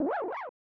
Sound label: Tile Match Jingle; Shanghai 2 Dragon's Eye; snes